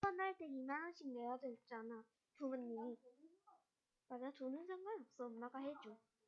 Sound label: cry